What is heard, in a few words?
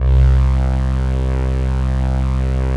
Atmo Pads; Best Service; XX Large